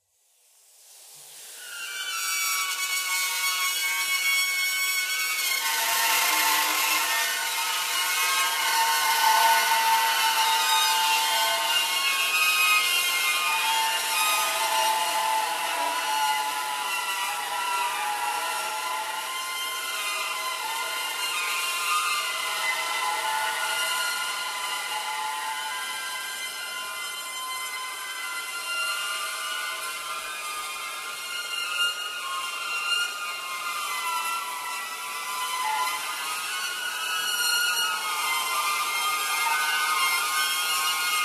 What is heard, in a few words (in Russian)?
vizg strui vozduha